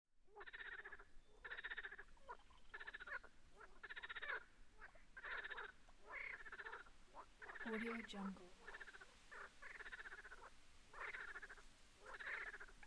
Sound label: frogs; dedgestudio